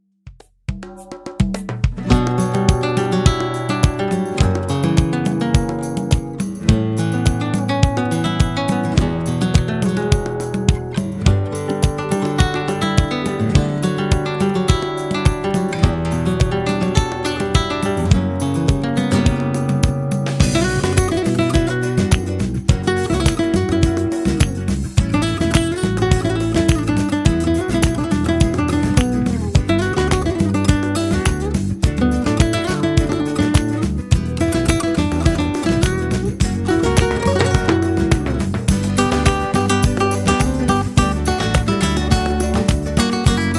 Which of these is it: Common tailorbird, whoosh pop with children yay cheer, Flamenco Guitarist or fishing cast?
Flamenco Guitarist